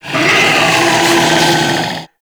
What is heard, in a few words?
Infested Ancient Idle, Enemies; Warframe